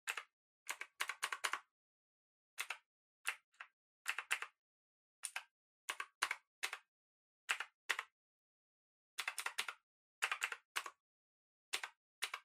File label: PC Keyboard Far Arrow